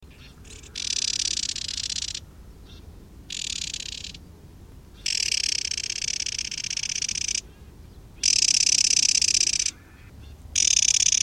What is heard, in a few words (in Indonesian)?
suara alarm warbler kacamata